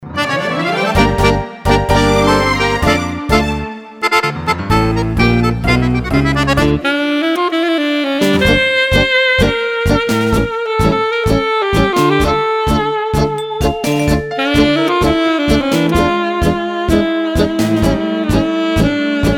ROMANTICO SAX, BALLI DI GARA

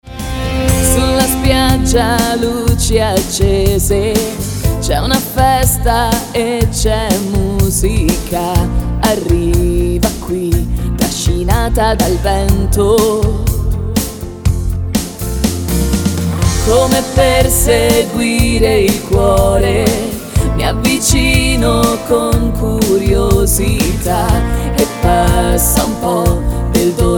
rock'n'roll

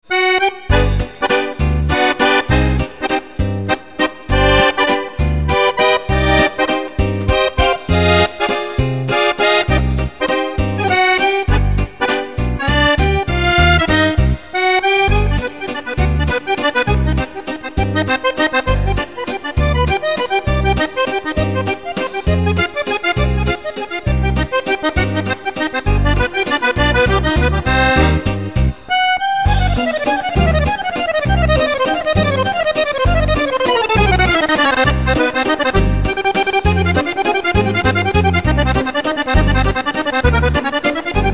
accordion suite

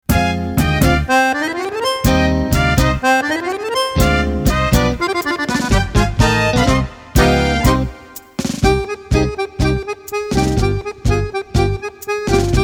accordion tangos

